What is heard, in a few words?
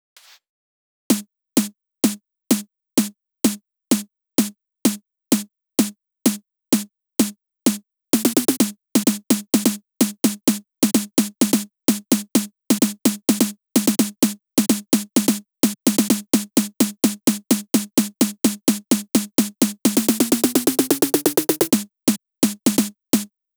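Drum Loop snare Main, 128BPM; VEC5; Multi Loops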